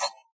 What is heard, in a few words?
bump; There; ThereClient